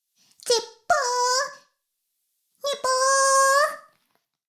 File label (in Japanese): シコシコ音声; 古き城のドラゴニート2